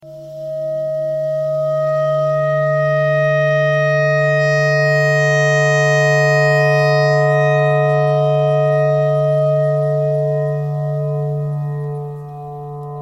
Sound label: clarinet notes e clarinet thread noise